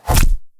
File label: bullet hits body